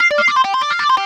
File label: Hero Riff, Keyboards